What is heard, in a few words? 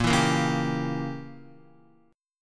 A sharp Diminished 7th Guitar, chord; strummed; JGuitar